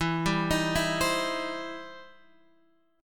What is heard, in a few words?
E Minor Major 7th Sharp 5th E